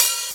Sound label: Dry Open High-Hat C# Key, Royality free open hat tuned to the C# note